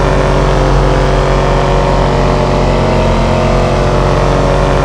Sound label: high, engines; rise vehsounds; MANSORY